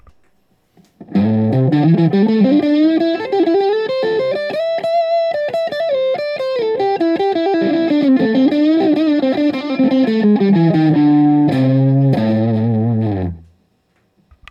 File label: Guild 97 S100 Neck Riff, guitar; Guild’s S100 guitar, which appears on the surface to be a copy of Gibson’s SG